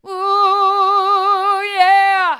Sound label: ooh yeah hard, vocal